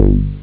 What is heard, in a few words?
analog, synth bass